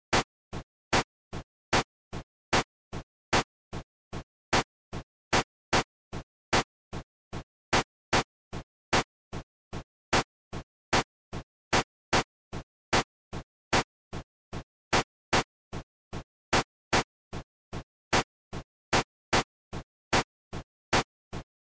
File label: Shifting accents, rhythm; music